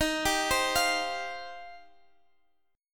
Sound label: D sharp 6th Add 9th, Guitar, DADGAD